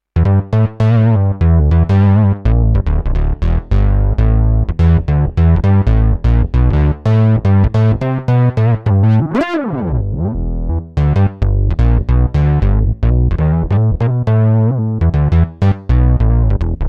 Puncher

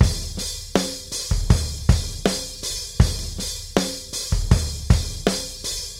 Hard Rock Chorus; Zoom R20

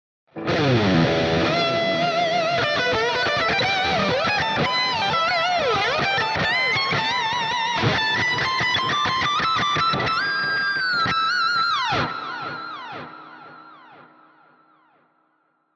Jackson PRO PLUS Solist SLA3 HighGain Lead

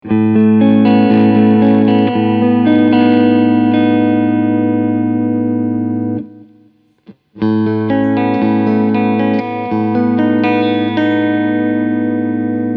Pickup Check

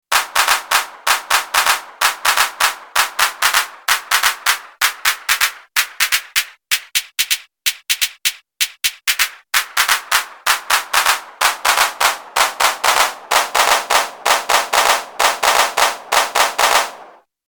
EMW Digi Drum pitch range

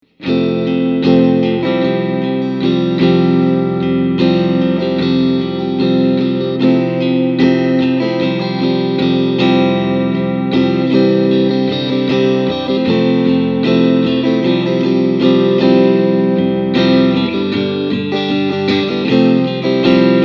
Clean Strumming

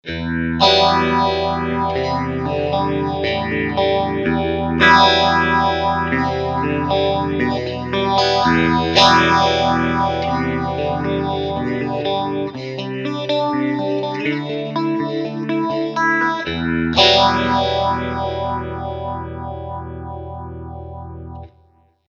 breathe phaser

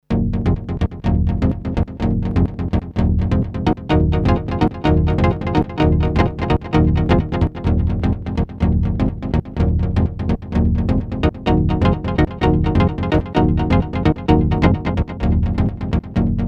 Detroit Minor

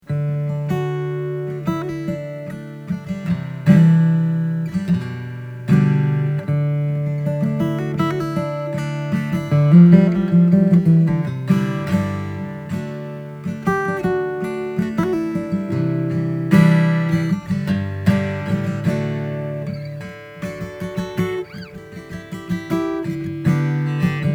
Alvarez MGA66 ESHB AST

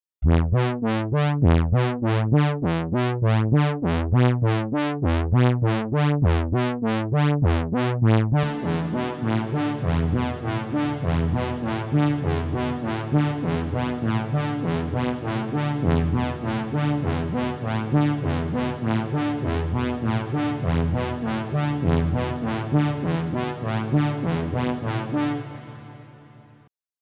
Piano; Tverb

